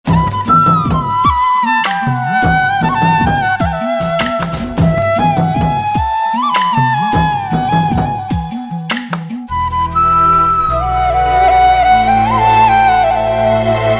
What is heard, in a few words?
Malayalam Hit